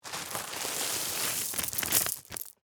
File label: Bag Rocks Dump, Foley, Plastic, Out; Materials; Blast Wave